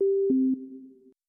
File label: receive; Digsby